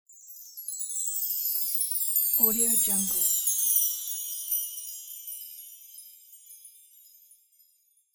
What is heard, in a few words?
magic shine